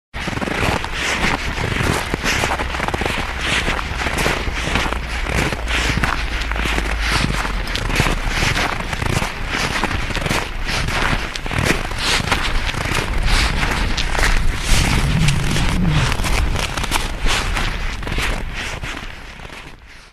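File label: footsteps on snow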